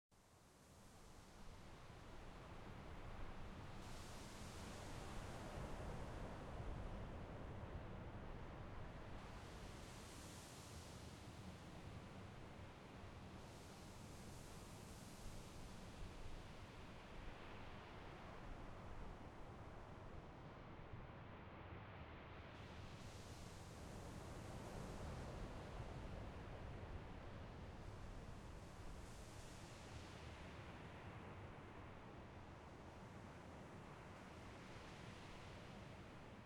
Wind Ambience Loop; Birdhouse

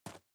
SFX run gravel

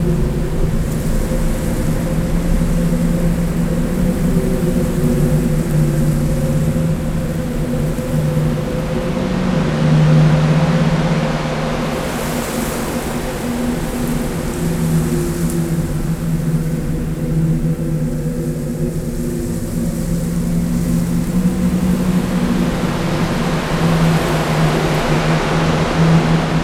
Atmo Hub Outskirts; Blood Knights; Xbox 360

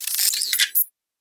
Additional Weapon Sounds

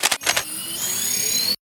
Sci-Fi Weapons-Loading Fx

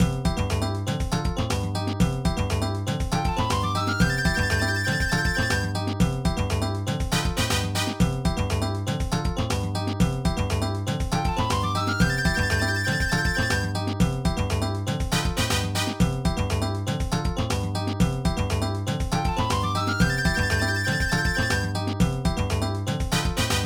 MENU BGM; kids station barbapapa ps1 gamerip 2001

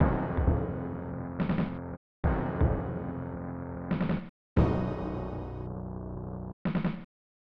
Unknown Jingle; Turn and Burn: No-Fly Zone; SNES

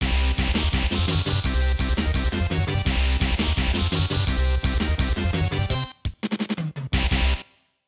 Match Lose

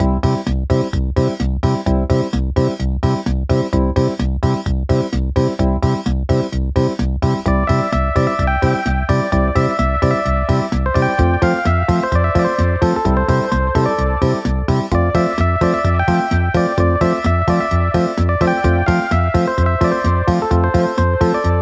CASIO Disco; Wunderling

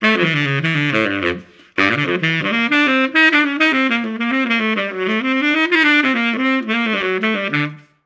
Tuba

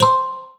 Success Xylophone Two Note Fast Wet stereo

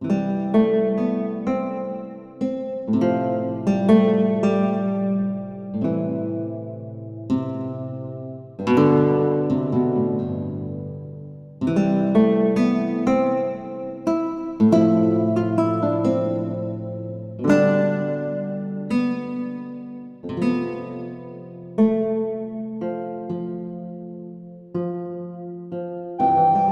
Tavern & Village Calm Loop

